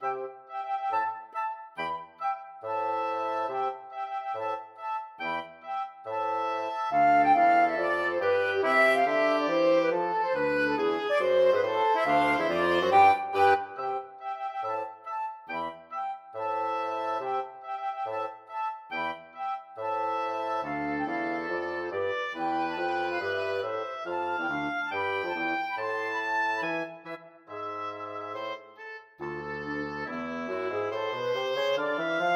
flexible mixed ensemble, 4 players

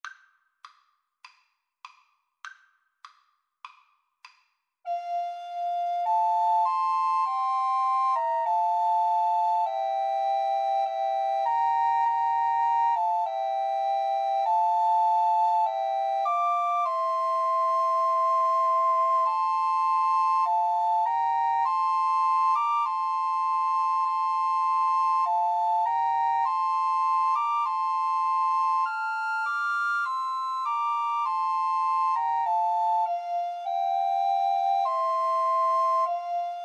my faith looks up, Recorder Trio; SAT

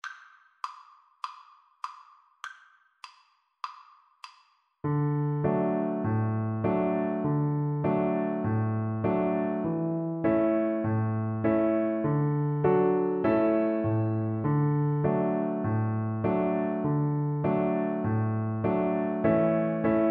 mary had a little lamb, Viola

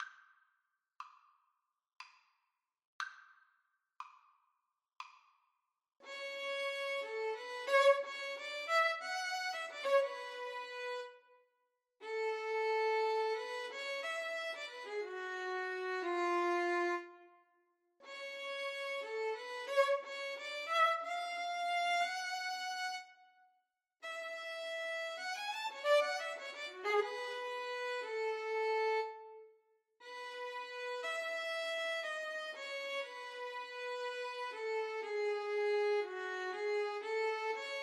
adagio violin duet

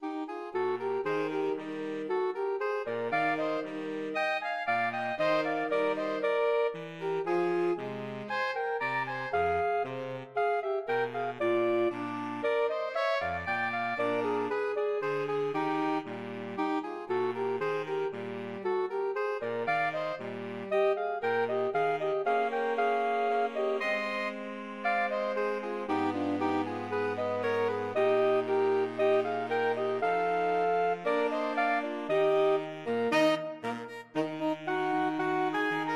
por SAX quartet

